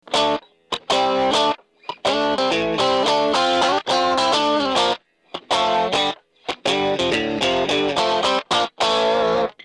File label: cable